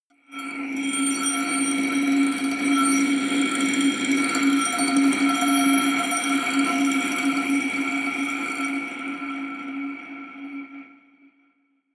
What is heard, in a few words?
glass ring rattle high, drones; musikelemente